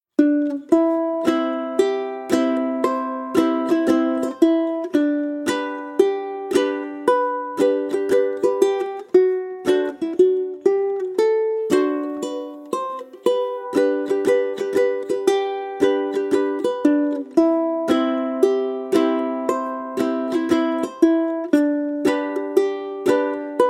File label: Aloha Oe In G Chord Melody Only